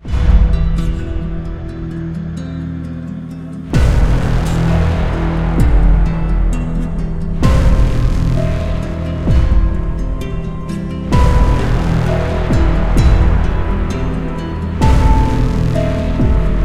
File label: Music Guitar; Hatred